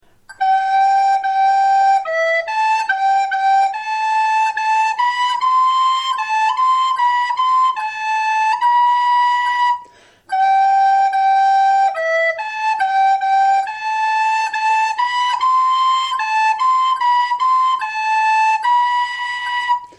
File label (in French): Air de cour soprano, FLUTE